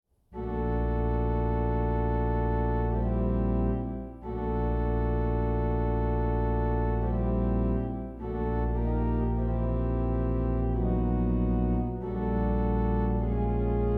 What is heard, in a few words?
hymn, organist